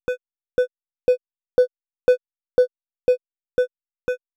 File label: Tonal Bursts Delay Panned